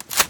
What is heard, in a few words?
spas pump back, weapons; garrysmod